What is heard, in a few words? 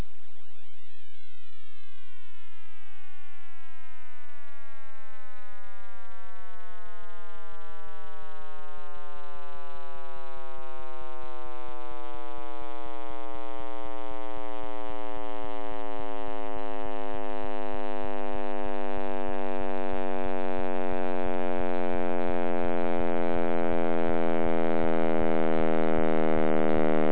big bang sound